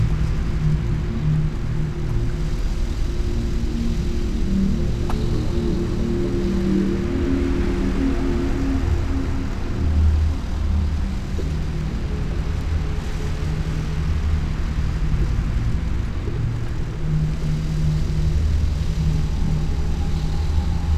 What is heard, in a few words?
ship juggernaut idle; Stellaris; toxoids; ships